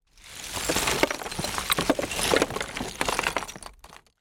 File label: foley small debris rubble movement